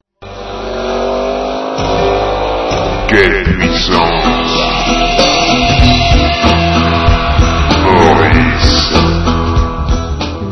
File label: jingle